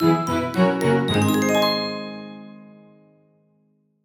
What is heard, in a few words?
win; 结算音效